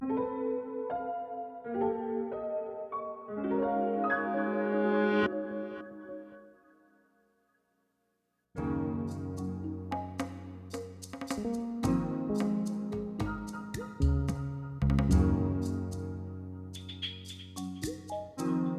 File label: Rainfall (Noon, Working); Pikmin 3